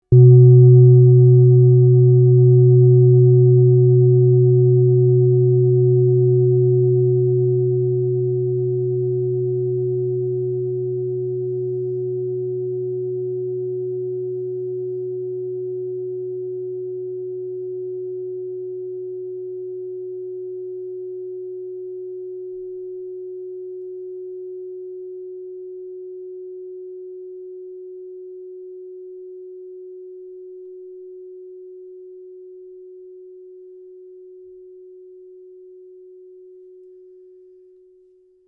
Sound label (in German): klangschale sonne